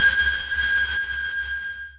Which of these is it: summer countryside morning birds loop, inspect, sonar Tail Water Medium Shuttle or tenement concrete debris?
sonar Tail Water Medium Shuttle